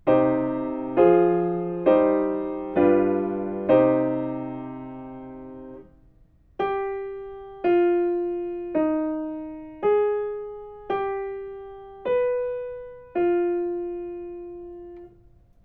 melodic fragments

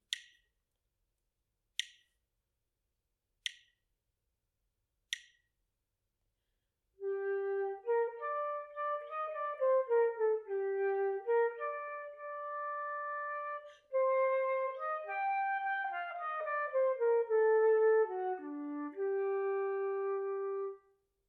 melodic dictation; ear training

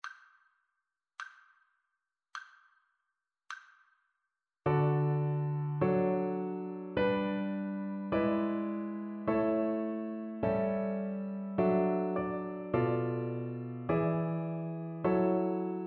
harmonic dictation

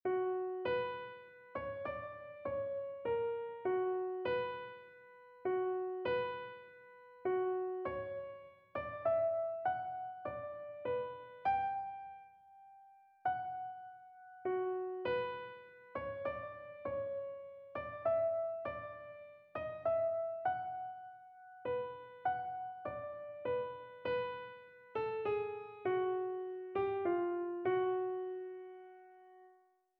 melodic error detection; ear training

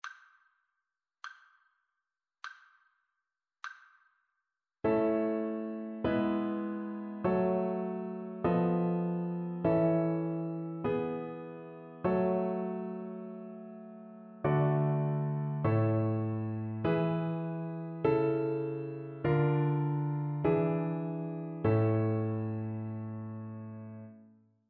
harmonic dictation

